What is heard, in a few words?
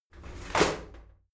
shower slide doors open heavy; objekte; tueren